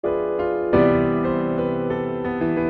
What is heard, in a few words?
Message Tone